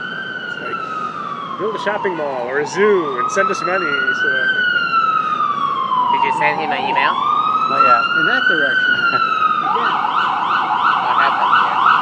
ambulance passing on Amsterdam Ave

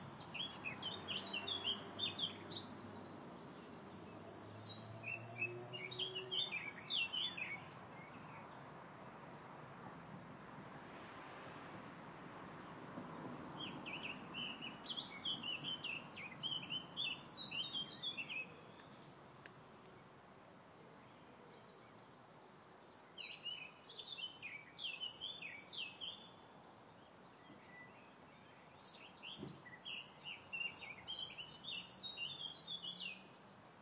park birdsong

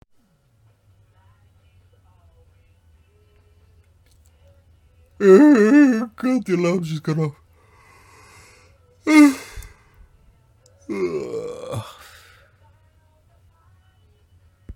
test of the audio recorder on lumia930

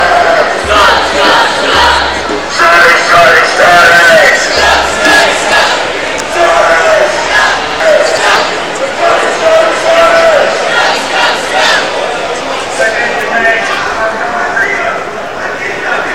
anti tory chant

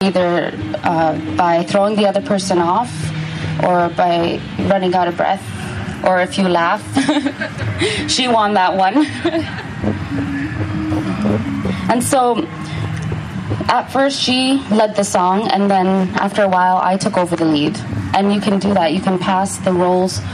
throat singing a friendly competition

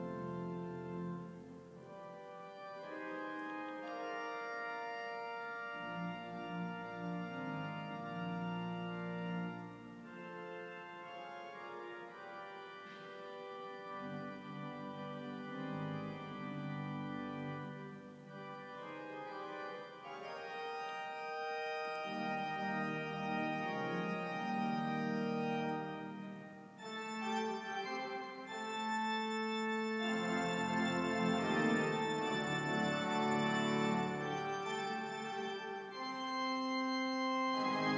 mad organ practice; st mary redcliffe

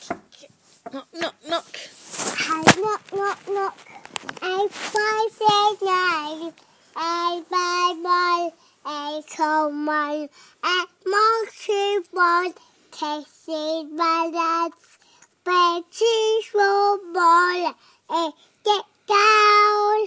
singing

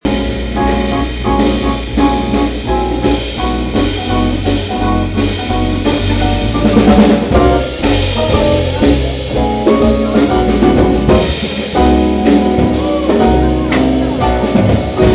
jazz; at frank s place

